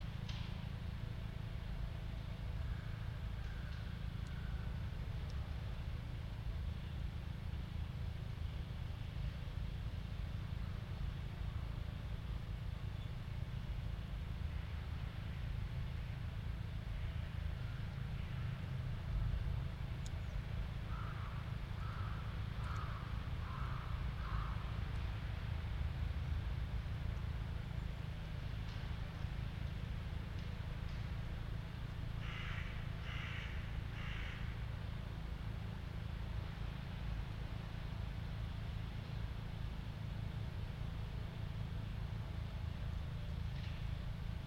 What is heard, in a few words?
yamanakako sound